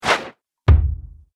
Dagger Throw Heavy Hit; combat; knife

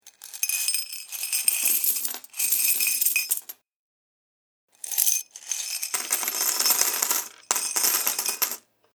Piggy Bank, Emptying coins out of a piggy bank.; Money; Objects

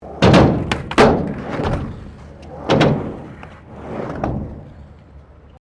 SKATEBOARD HALFPIPE WOOD; Skateboard sounds; Skateboarding; Sound Effects:Sports

